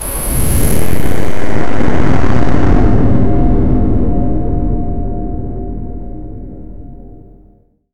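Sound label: advanced spiritual lightning, energy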